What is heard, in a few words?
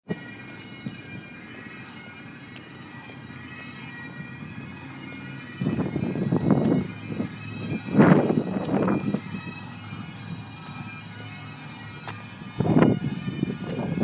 band practise